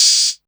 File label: open hihats, techno; Techno ID; single-drums